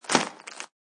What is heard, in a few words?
Plastic Food Package On Counter, Plastic food wrapping or package such as chips or cookies, Crinkling; SFXsource